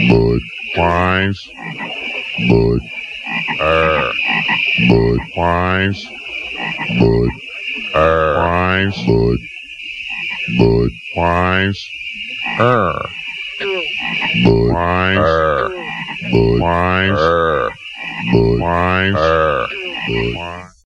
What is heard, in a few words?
bud frogs; animals